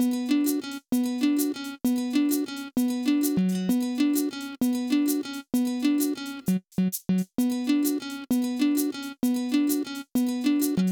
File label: Hip-Hop; Strung Along 65